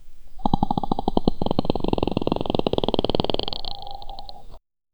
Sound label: muscles growing and bulgi, exercise